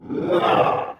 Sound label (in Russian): udar